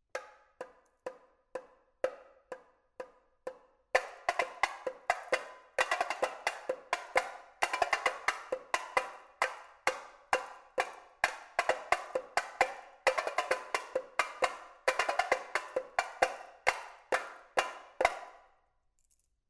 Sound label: Tambourim, marcha